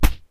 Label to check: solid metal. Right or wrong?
Wrong